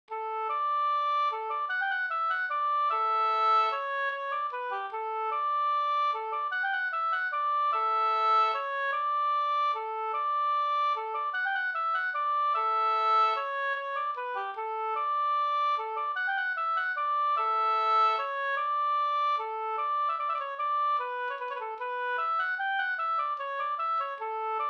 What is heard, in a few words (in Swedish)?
vals